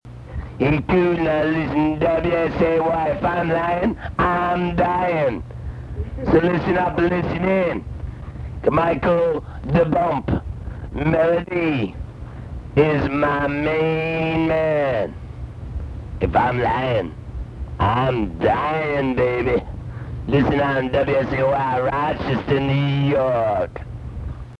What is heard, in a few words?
wolfman melody